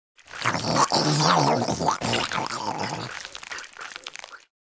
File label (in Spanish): zombie comiendo